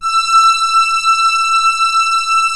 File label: VOY8 STRING, VOYETRA 8; Greytsounds VOL-1